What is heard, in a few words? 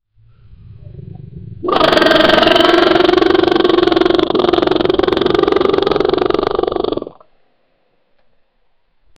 tyrannosaurus rex roaring